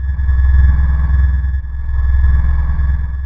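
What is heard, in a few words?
Ambience Sci-fi Energy Loop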